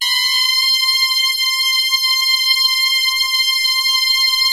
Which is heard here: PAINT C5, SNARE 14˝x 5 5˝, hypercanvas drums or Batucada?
PAINT C5